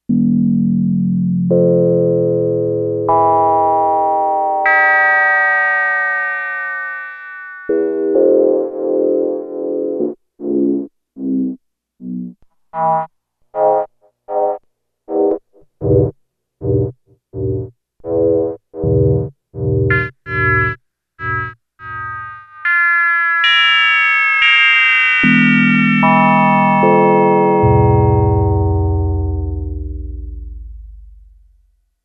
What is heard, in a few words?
Descending Bells